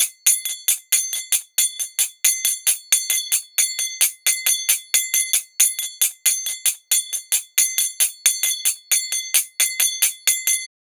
Tull Loop BPM 75, Assamese folk; Gully Loops; waves of bihu

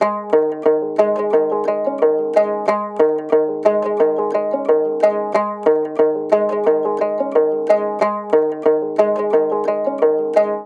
Dotura Loop BPM 90 G Maj, Assamese folk; Gully Loops; waves of bihu

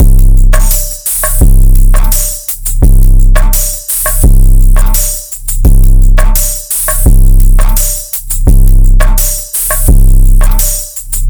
Drum Loop 170 BPM; phonk; Gully Loops; Randy; Aatma; atma phonk sample pack

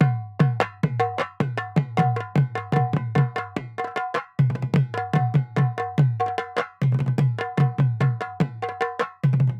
Negera Loop BPM 100, Assamese folk; Gully Loops; waves of bihu

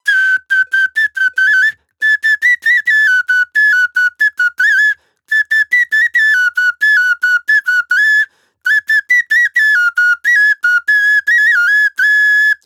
Hutuli Loop A Maj, Assamese folk; Gully Loops; waves of bihu

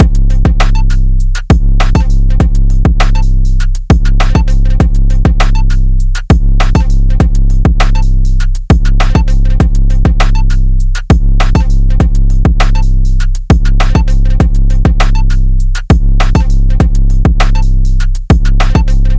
Drum Loop 100 BPM; phonk; Gully Loops; Minati; Aatma; atma phonk sample pack

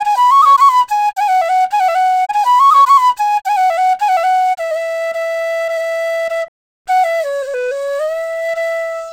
EDM Flute Loop 105 BPM A; Gully Loops; flute melody bundle

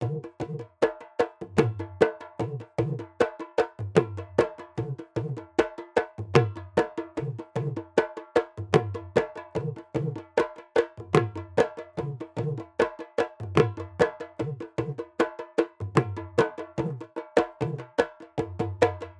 Dhool Loop BPM 100, Assamese folk; Gully Loops; waves of bihu

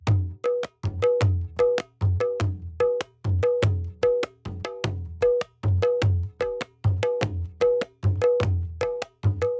Khool Loop BPM 100, Assamese folk; Gully Loops; waves of bihu

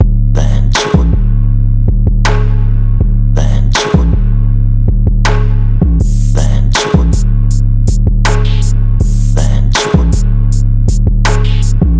Top Loop 160 BPM; phonk; Gully Loops; Aatma; atma phonk sample pack

